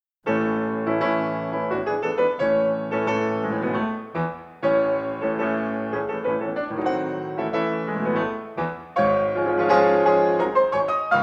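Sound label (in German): Flügel klassisch, Tasteninstrument; Klavier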